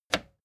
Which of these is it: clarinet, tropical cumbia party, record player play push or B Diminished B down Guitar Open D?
record player play push